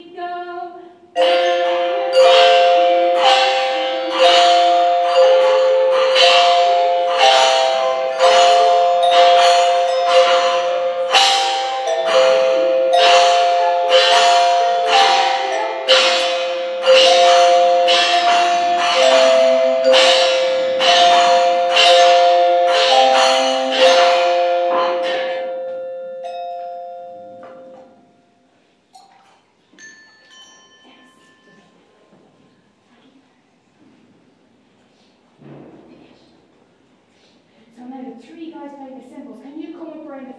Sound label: instrumental end of year concert